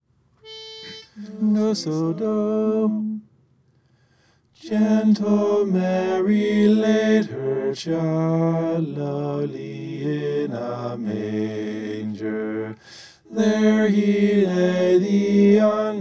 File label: bass